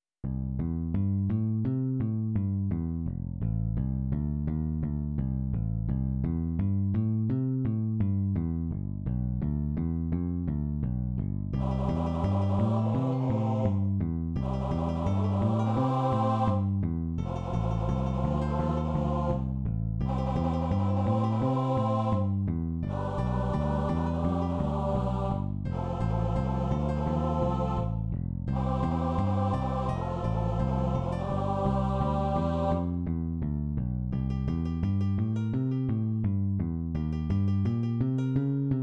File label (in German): Bass